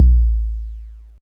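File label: bass drum 808 SP1200 A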